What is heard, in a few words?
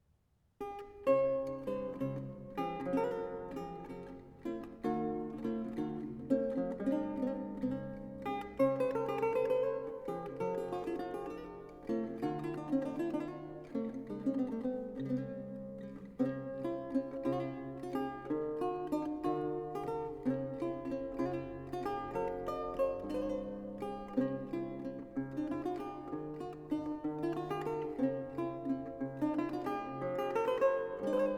Lute Solo; GREENSLEEVES; Watkin's Ale